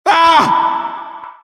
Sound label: headbomb hit; merasmus